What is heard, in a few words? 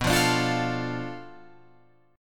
B Minor 7th Flat 5th B, Guitar Open F; Bm7b5; strummed; 11,12,14,12,12,12; JGuitar